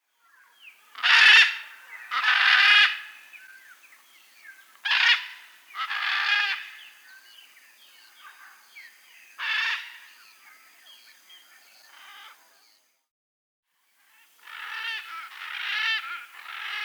Blue-and-yellow Macaw (Ara ararauna), Bolivia, Peru; S. America